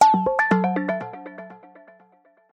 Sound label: Gongio, notifications; Gigaset GS290